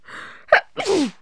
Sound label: sneeze, cartoon, human; KABOOM! - Wild and Wacky Sound Software